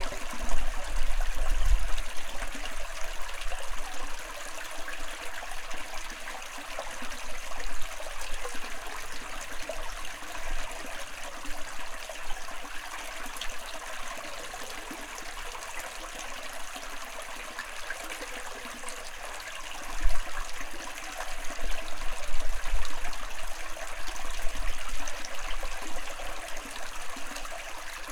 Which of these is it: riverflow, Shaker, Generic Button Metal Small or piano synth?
riverflow